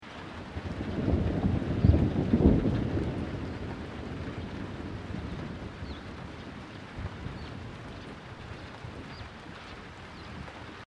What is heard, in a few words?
THUNDER; Elemental weather effects; Thunder (Sound Effects:Weather)